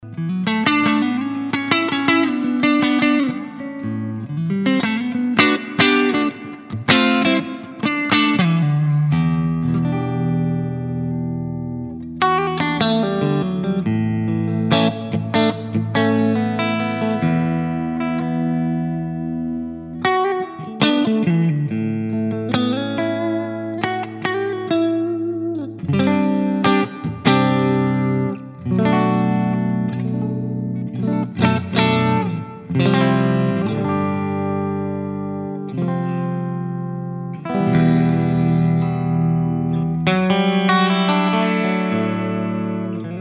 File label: jazzy